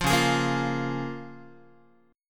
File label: C sharp 6th C sharp down Guitar; strummed; C#6; JGuitar